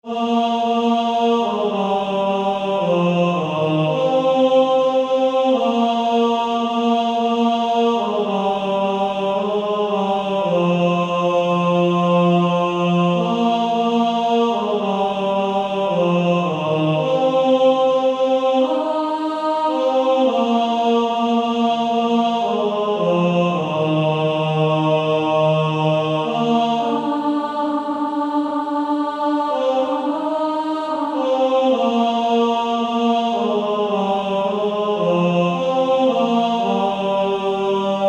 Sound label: Male Choir; He Hideth My Soul